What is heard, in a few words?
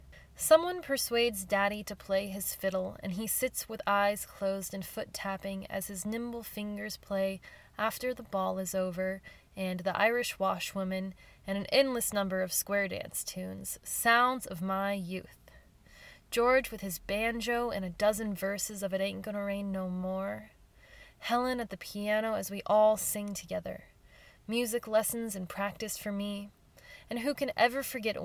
fiddle